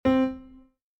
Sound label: Piano; scarlet hood and the wicked wood linux macos switch windows gamerip 2021